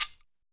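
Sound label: scrape shaker; Drums In Your FACE!; POP-ROCK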